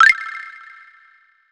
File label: Lose Air; Banjo-Kazooie HD; N64 gamerip 1998